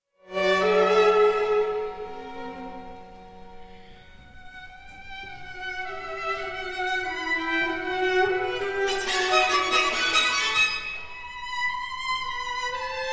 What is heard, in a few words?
string quartet; string quartet no 1